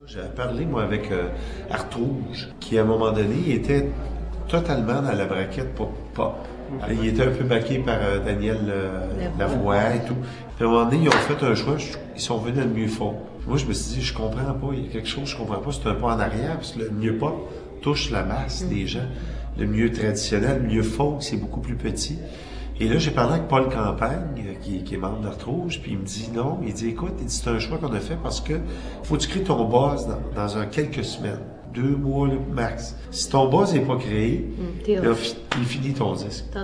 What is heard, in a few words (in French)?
pop folk